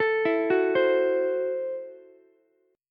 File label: Gb Minor 7th Flat 5th, Ukulele; arpeggiated; JGuitar